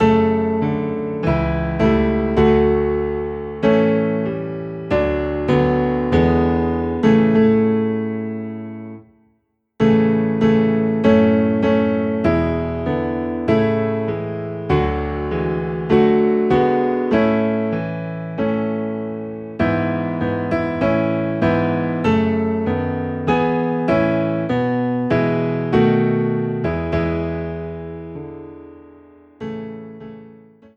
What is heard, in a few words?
Piano Low in A, hymns; Holy Holy Holy